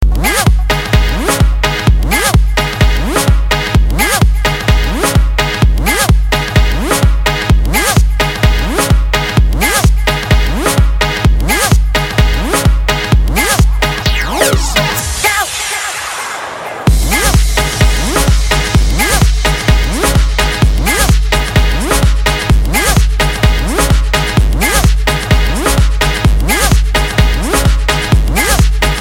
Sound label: Ibiza Party Mix